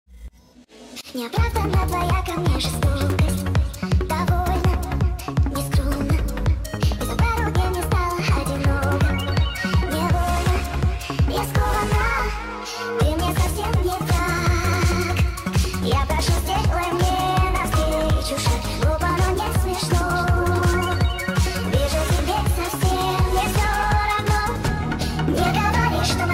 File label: Remix (hardstyle - hardocore)